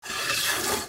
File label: Wood slide open; Nokia; audio themes